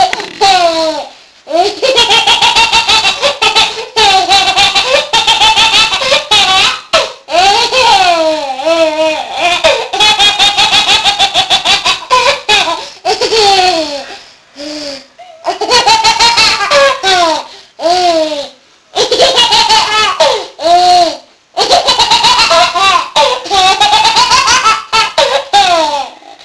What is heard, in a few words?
birthday giggles; wish my Nans a Happy 93rd Birthday